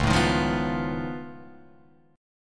C sharp Augmented 9th C sharp down Guitar Open F, chord; C#+9; strummed; JGuitar